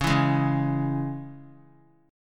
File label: C sharp Minor, chord; C#m; strummed; JGuitar